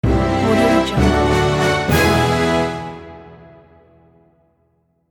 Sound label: orchestral win logo